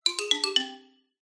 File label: cartoon xylophone descend; zapsplat